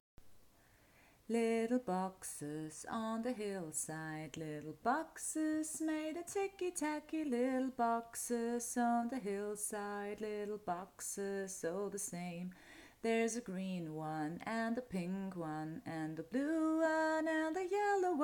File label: F Acapella Singing